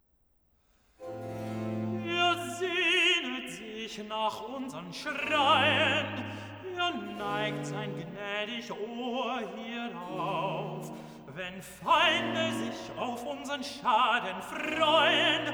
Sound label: Cantata, Recitativo (Tenor); BWV 115 'Mache dich, mein Geist, bereit'; V. Recitativo (Tenor) 'Er sehnet sich nach un; Bach; Tooting; 22nd Sun After Trinity